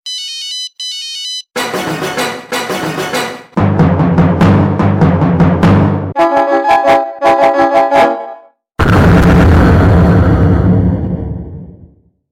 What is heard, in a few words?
Bomb Sms